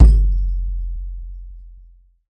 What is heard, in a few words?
low tom drum f sharp key; tom drum samples; one shots